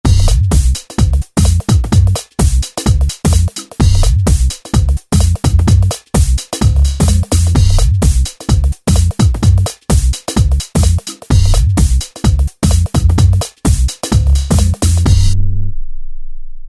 Garage House, Korg ES-1; Factory Patterns

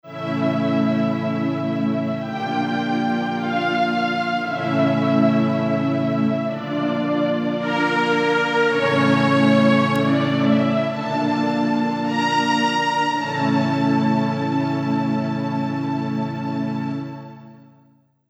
String Pad

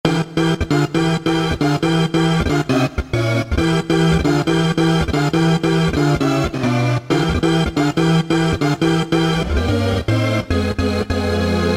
Lo-Fi Rave; Roland SC-880; Factory Patches

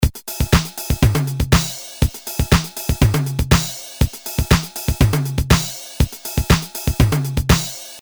Fill-in Latin, Boss DR-220A Preset Patterns

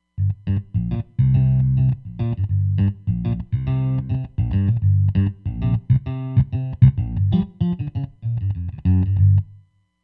Sticky Octave

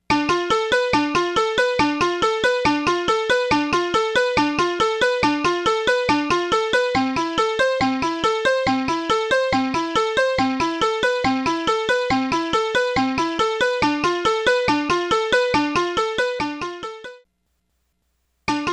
Delay 140bpm, Roland SRV-3030; Preset; RSSDelay140bpm

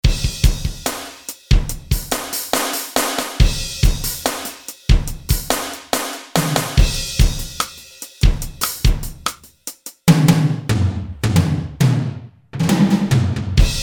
Whakker Kit; Roland L-CDX-01 Rhythm Section Instruments

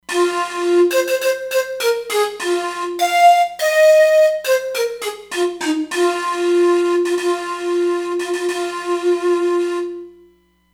Pan Pipes

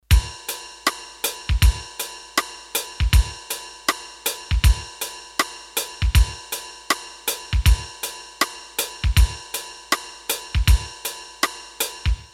Jazz, Roland D-10; Rhythm Demos

